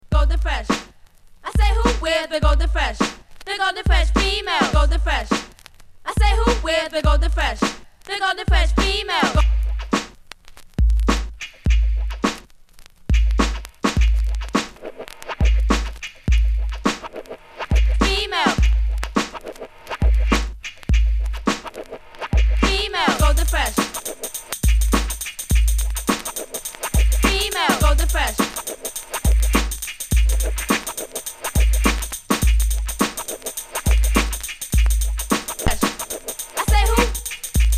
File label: (Inst); Sam Records